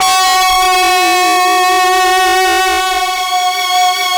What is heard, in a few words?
LEADS; Masterbits; Vintage Voltage